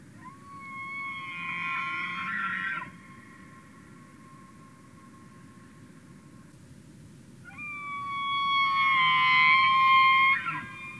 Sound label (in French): le wapiti, mammifères